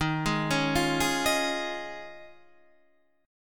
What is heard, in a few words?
Eb 9th Suspended 4th Eb, Guitar Open D; down